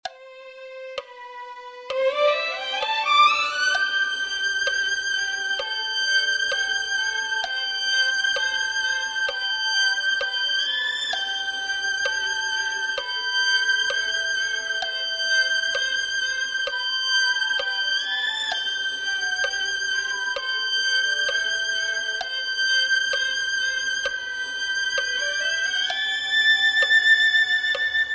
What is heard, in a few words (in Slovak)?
Husle; Chlieb Co Zjednodusene